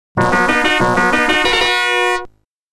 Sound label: Unused Jingle; arcade disc in jaleco shooting